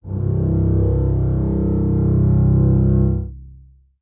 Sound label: string acoustic, cello